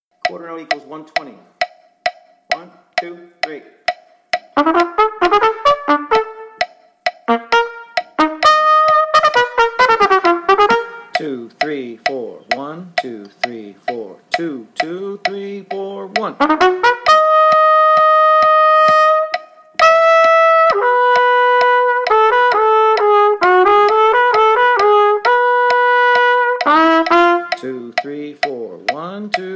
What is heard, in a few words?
Concerto, qn=132, with counting